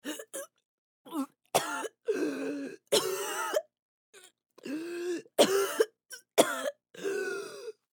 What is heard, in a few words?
Human Female Choking; Gagging; Voice